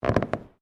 Floorboards Creak Close, Wooden floorboards creaking; Objects